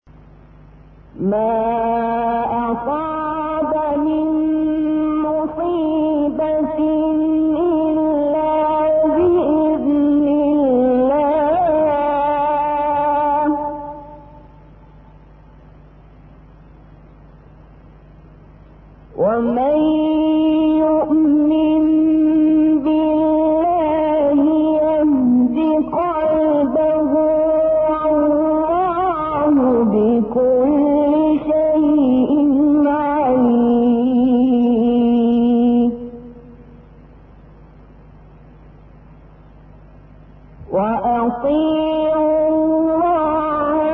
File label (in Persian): قرار دستگاه حجاز